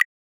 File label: Click Sound